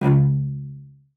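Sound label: CELLO spiccato C#2; Xsample VOL-5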